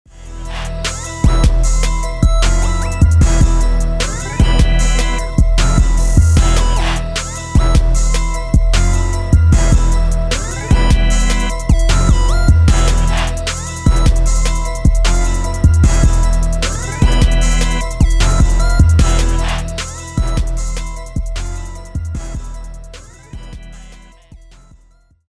type beat

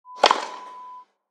Tennis serve

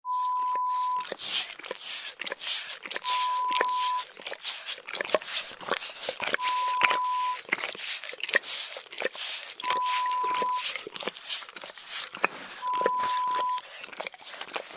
Dog licking sound

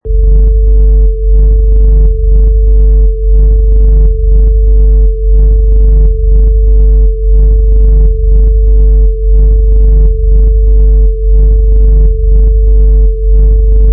Distorted Bass

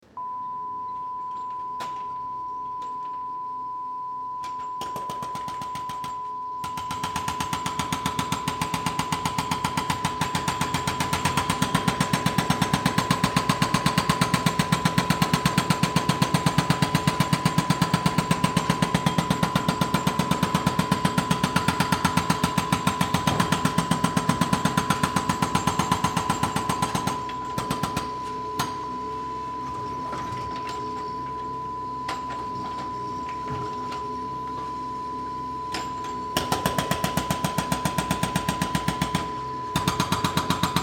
Roadworks Ext

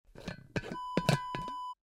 glass jar lid open

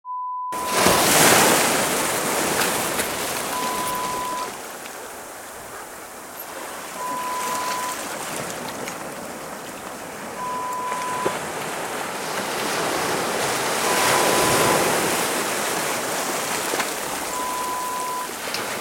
Sea sound

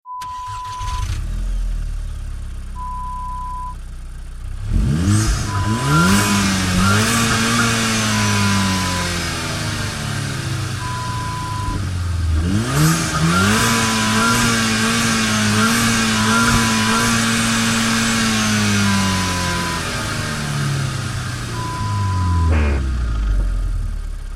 Car

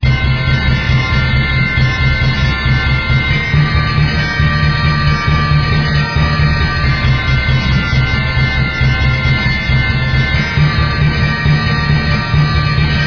Manic Percussion

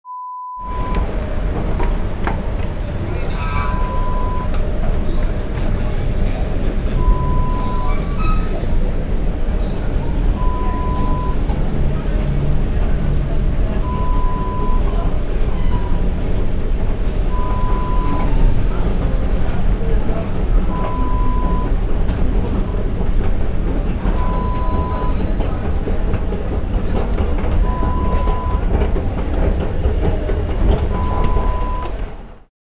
Escalator sound

